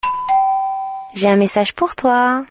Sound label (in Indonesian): Bel german